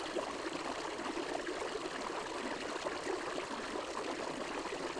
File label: stream near small waterfall